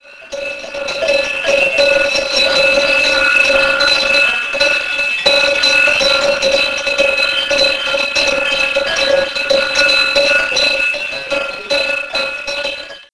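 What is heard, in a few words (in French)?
clochette troupeau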